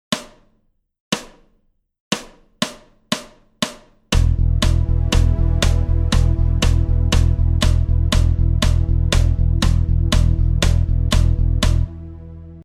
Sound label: LICK FAST, guitarra